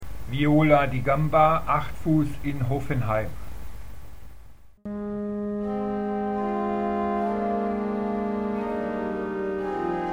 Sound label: violdigamb